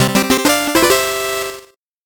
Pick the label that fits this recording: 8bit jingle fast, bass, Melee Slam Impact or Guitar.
8bit jingle fast